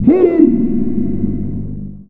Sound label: VOX FX; Big Beat Samples & More